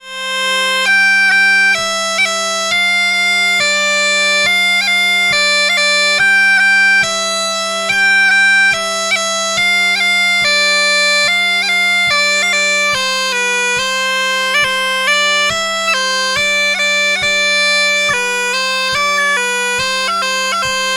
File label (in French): polka; pere machin